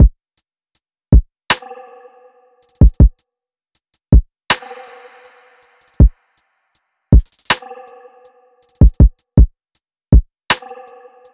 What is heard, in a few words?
Drum Loop 160 BPM; Positive; Cymatics; Vibes II Drum Loop Collection